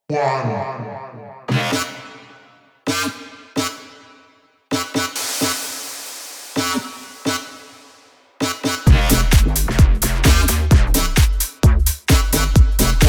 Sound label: EDM